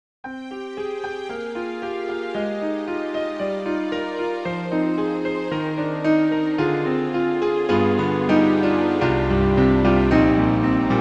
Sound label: HALion 4 Piano + Strings; Steinberg